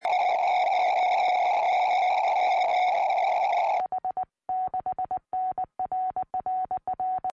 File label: 70cm, tropo